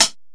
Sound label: CLOSED HI-HAT; drums